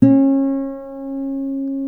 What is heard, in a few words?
D 4C MARTIN 6, Guitars